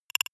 noise click metal; maschinen; signale; soundpool

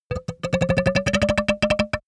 goblin fart, comedy cartoon; soundpool

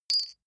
medium metal impact medium rolling

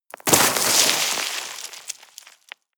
step gravel slip down debris; schritte; menschen

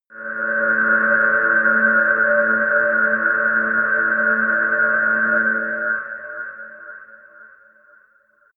sci-fi quiet planet, drones

